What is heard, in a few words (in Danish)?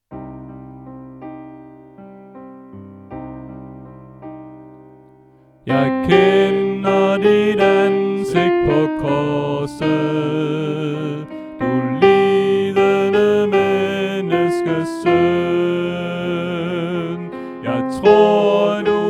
Sopran; Påske; oevefiler